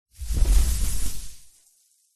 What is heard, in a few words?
snow impact and debris snow load drop; silverplatter audio